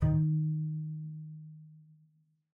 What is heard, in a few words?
D#3, bass pizz; Xsample VOL-5